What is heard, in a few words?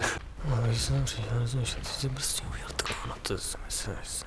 whimper; Mafie 1